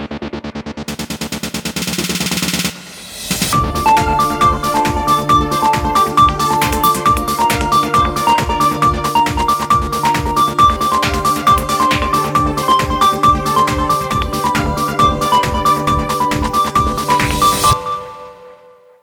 ringtone; Explay Golf; Hearten

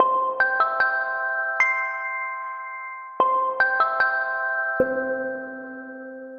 In the early morning greetings; Meitu; T8

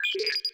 Dock; RED Hydrogen One

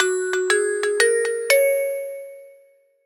Brilliant; Explay Golf; notifications

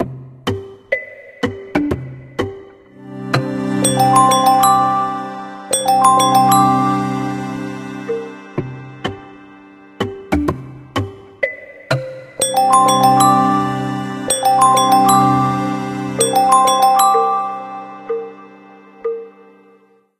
Wood Dance, ringtones; Honor; X9b-5G

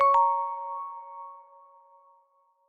Flowering, notifications; Lenovo; ZUI 2

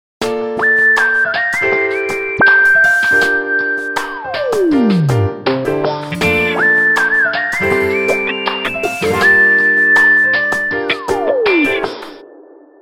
Pop; Pop Coolpad

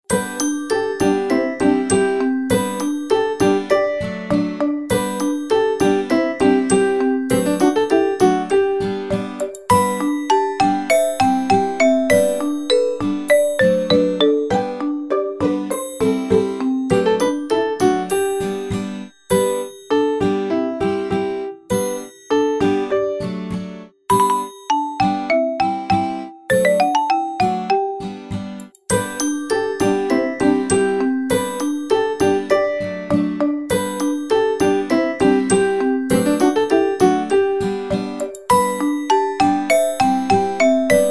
Tone Piano; BQ 5731L-Magic-S; ringtones; BQ Russia

